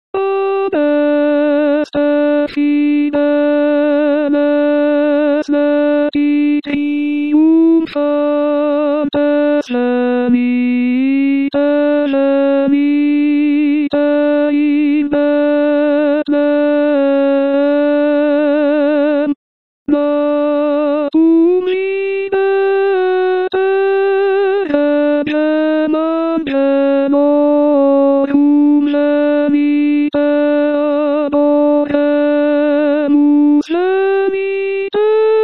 adeste fideles Alto

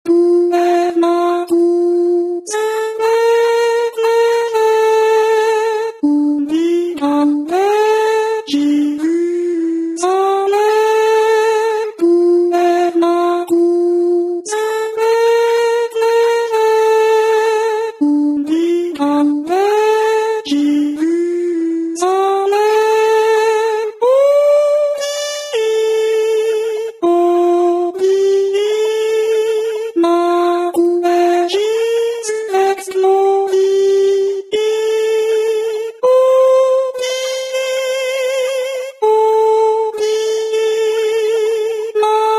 Tenors; puer natus

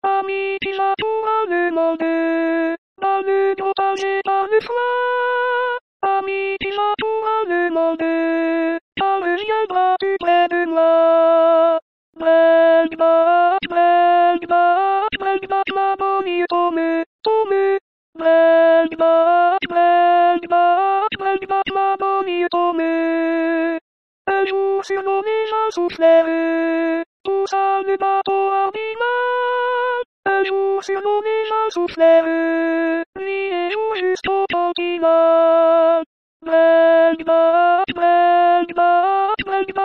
bring back Alto

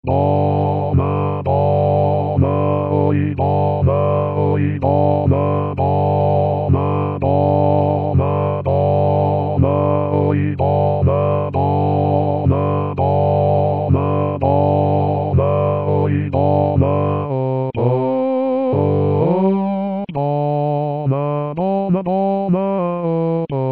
Mazur Basse staff

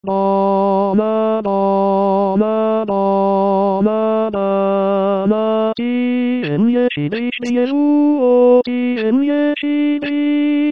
Mazur Tenor staff

